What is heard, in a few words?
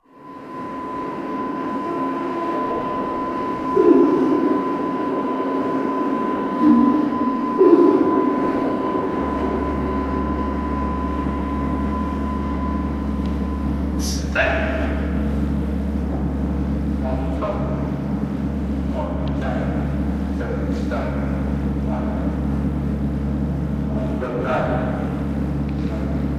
transportation